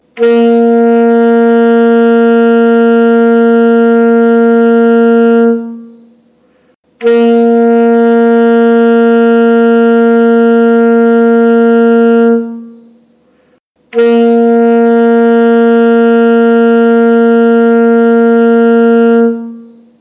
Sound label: alto sax g